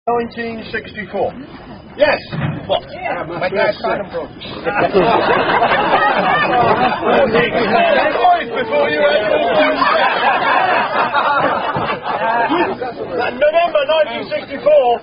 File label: Sung From Lyric Sheet; Supercallousflagelisticsexpectcunninglingus